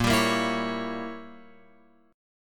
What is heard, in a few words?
Bb Diminished 7th Bb Guitar Open F; strummed; JGuitar